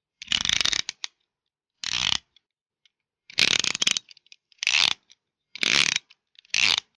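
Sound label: utility knife sound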